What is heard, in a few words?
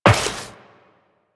Step Metal Perforated, Footsteps Walk; Warframe; Tenno